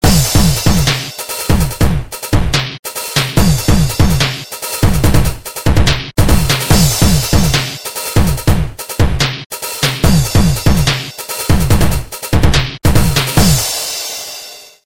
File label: BEAT Dubstep; Rhythm Core Alpha 2